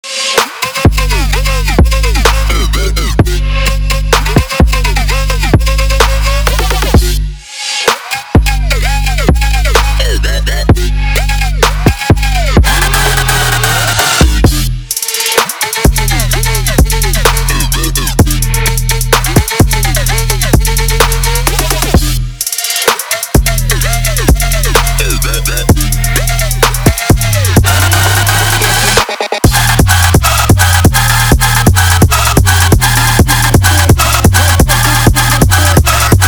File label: trap